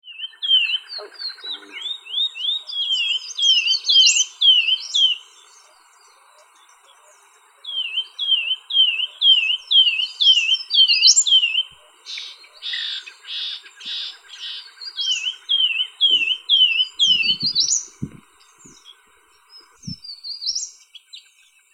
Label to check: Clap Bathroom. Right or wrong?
Wrong